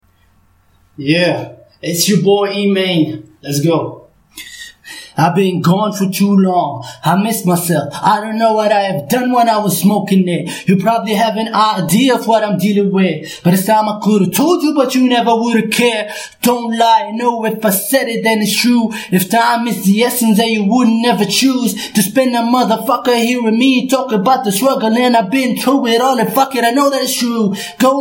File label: Rap, rap, 86, Bm; acapella